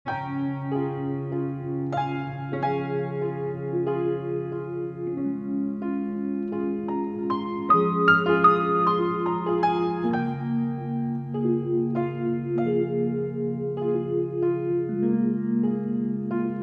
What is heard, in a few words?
ambient lydian jingle